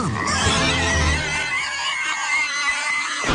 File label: Screech